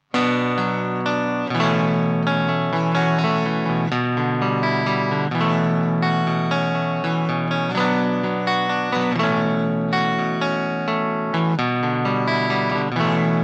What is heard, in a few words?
Bypass; MAXON PT9PRO+